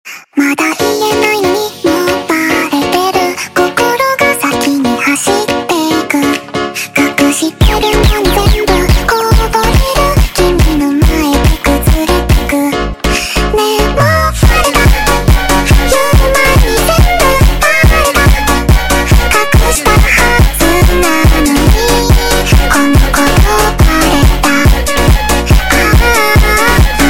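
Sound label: sped Up, phonk music; funk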